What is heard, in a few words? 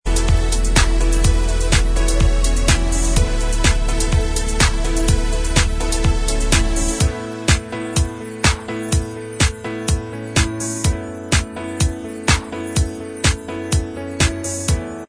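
instrumental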